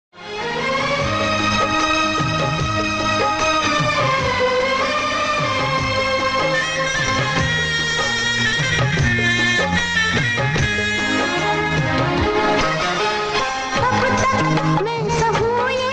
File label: Female; Prem Shakti 1994